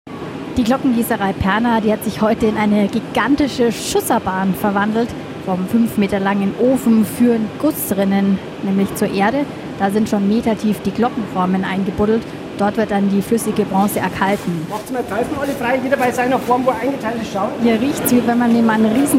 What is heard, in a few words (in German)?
Beim letzten Glockenguss in Passau; Perner